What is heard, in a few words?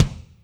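kick Movin Air, Alesis D4; 300 Drum Machines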